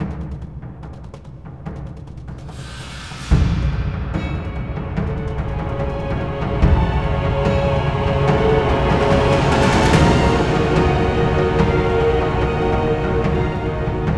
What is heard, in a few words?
Pvp